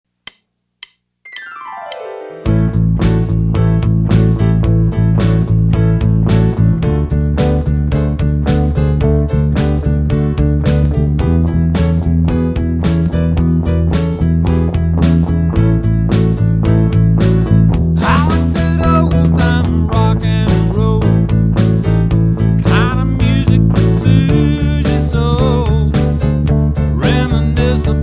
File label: Rocking Eighths Fast